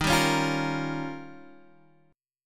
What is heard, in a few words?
D sharp 9th D sharp, Guitar Half Step Down